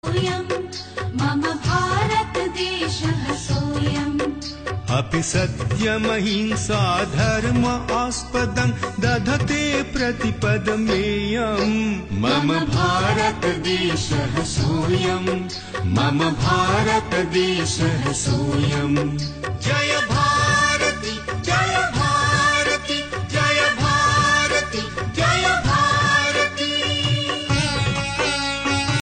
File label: beautiful sanskrit ringtone